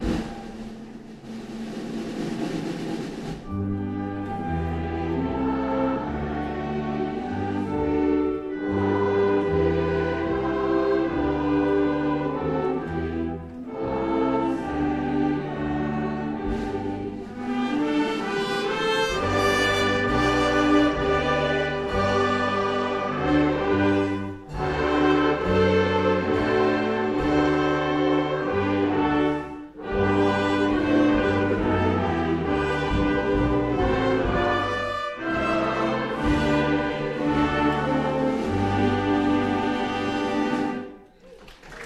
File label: National Anthem; 2012 Concert 17 June WBCB